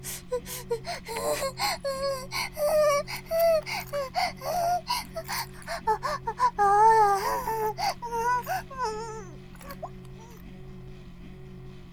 バイブ放置; イクたびにわけて

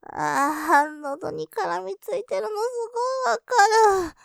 余韻; 喉にからみついてるの、すごいわかる♪; ループで楽しむ！シェフの気まぐれ抜き抜きコース; モノラル

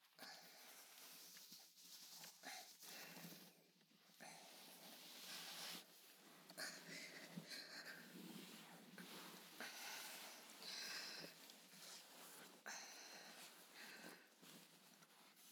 実演風フォーリーサウンド とある女の子のオナニー事情; ミニドラマVol.2

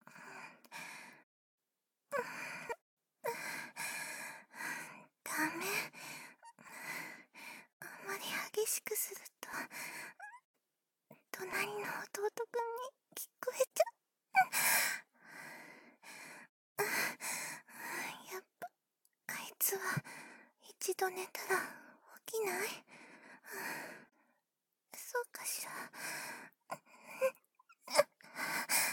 壁越しに聞く夫婦の営み バイノーラルマイク 効果音なし

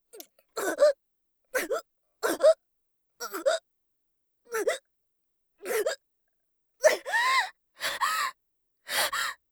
腹パン; 効果音なし

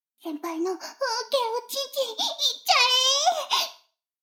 シコシコ音声; 逆時間停止されて

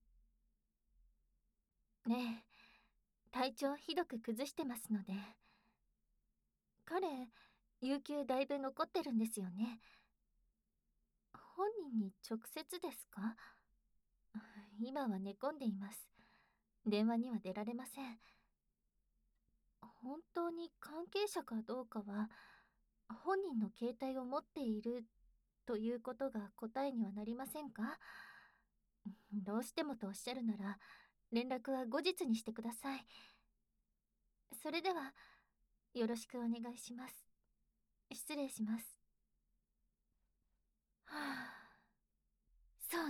耳舐め 手コキ; 過去③～常連さんへのサービスだから♪～

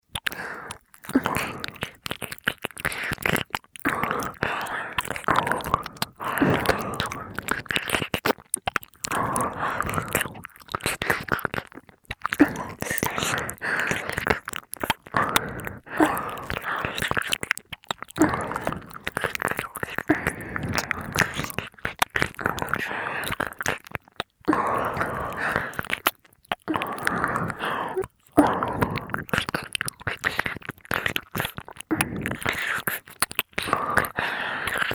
耳舐め 両耳

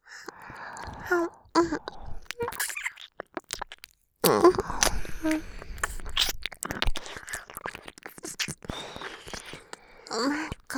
耳舐め; 由真; お屋敷メイドのハーレム淫語ヘヴン 気が済むまで耳舐め淫語を堪能できるバイノーラルオナサポ音声

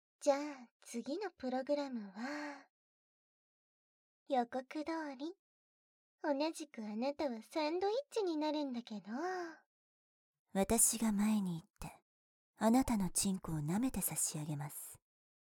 耳舐め＆フェラ; 女性自警団MUTE; 痴漢取り締まり+密着更生プログラム（こずえ×えりか）; 痴漢更生プログラム2

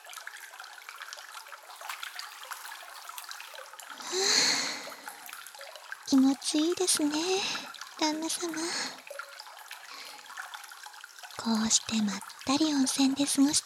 温泉でまったり（バイノーラルマイク収録 効果音あり）; オマケ

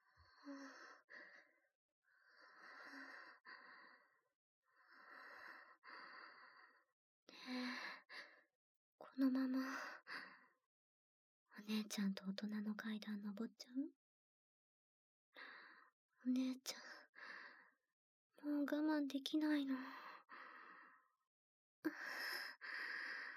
ぐっぽり耳舐め; 騎乗位で初えっちぴゅっぴゅ♪; えっちな効果音なし版

